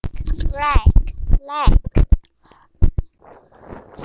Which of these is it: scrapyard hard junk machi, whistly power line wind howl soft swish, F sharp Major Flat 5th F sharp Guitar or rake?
rake